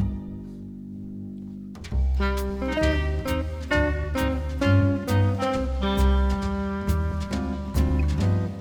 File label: jazz rest EMD